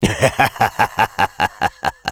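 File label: laugh evil, French; Sniper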